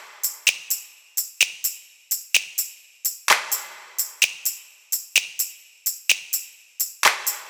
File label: Loops 128BPM, Drumloops